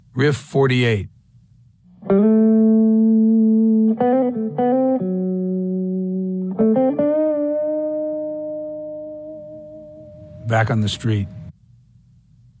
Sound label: Riff